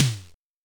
Oberheim DX

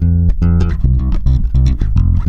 F#, Bass; Spectrasonics; Bass Legends; 117 CORAZON

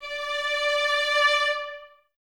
String Ensembles, Violas, VLS Detache

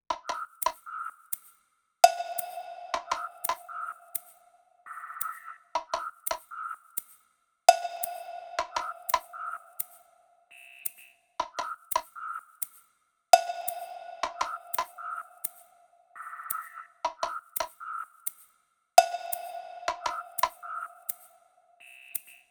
loop perc 85; Seismic Loops

